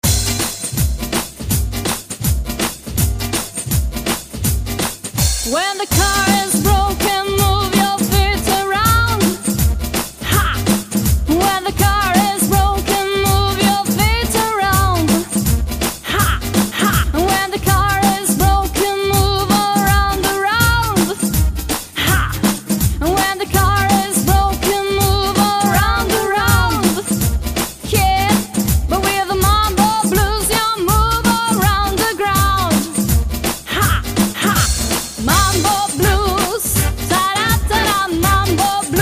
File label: Mambo blues